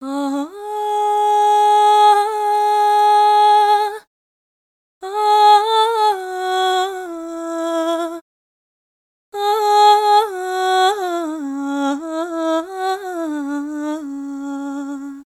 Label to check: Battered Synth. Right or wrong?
Wrong